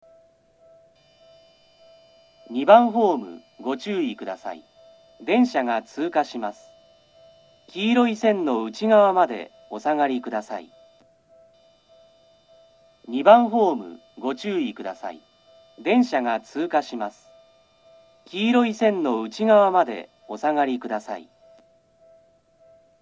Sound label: shiinamachi pass; seibu